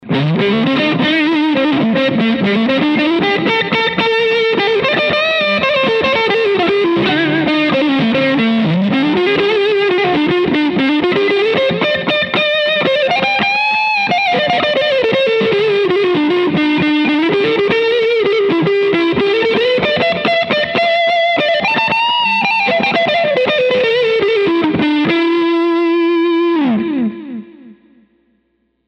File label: both dirt lead; prs22